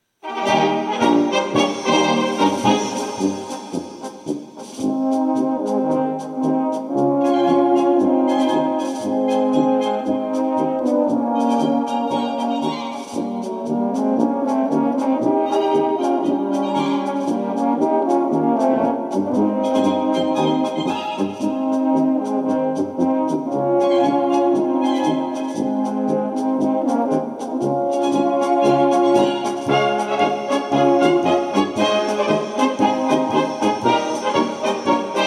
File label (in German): Polka